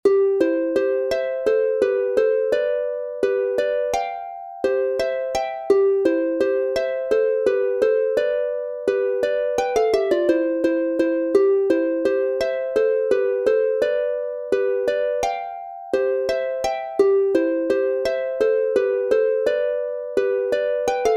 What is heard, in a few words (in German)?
Volksmusik aus Bayern Schottisch C Dur instr; Schottisch aus Murnau